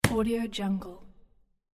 punch

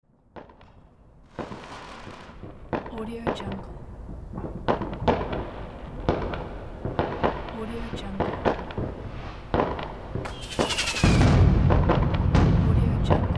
firework

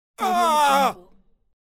male yell

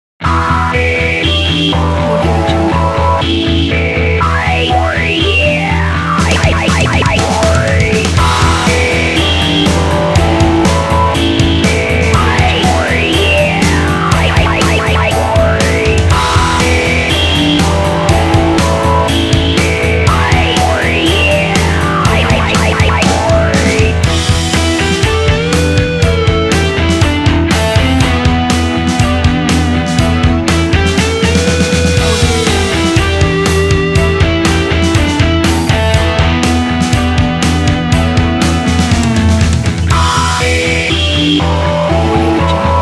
energetic rock vowel attack

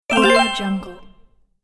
online gambling bonus

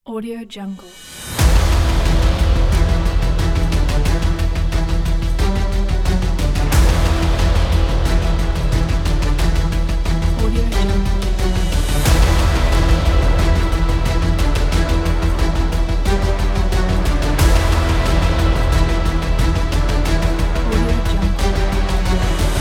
intense dramatic action trailer

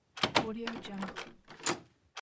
opening door; vb-production

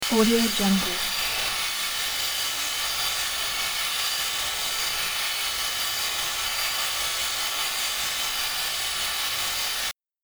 stream of data

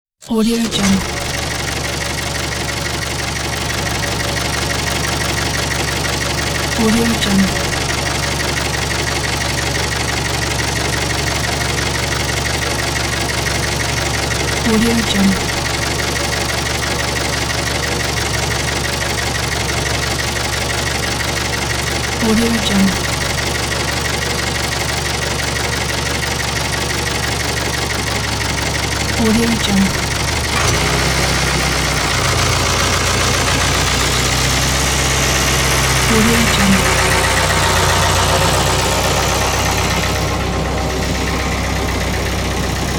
truck tractor start idle pull away

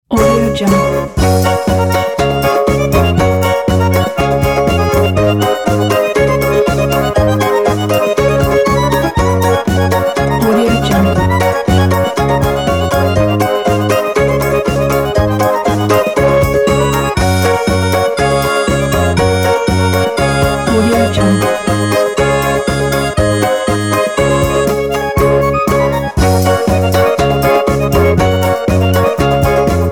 drunken fun intro

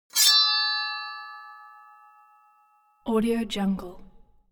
weapons sword wield ringing bright

